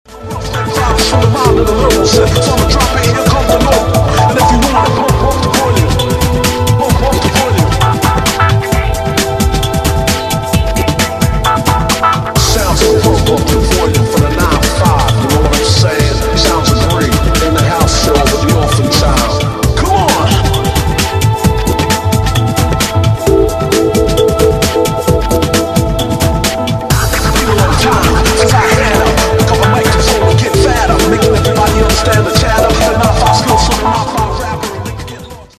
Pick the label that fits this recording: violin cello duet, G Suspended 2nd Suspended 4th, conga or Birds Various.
conga